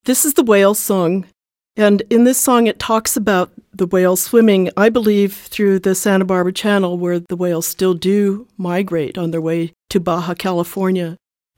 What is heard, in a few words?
whale song story